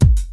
natural bass drum g sharp key, Royality free bass drum single shot tuned to the G# note